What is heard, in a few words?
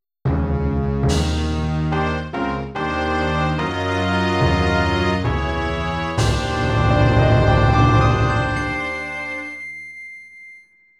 Boss Clear; Legend of Zelda, The - Ocarina of Time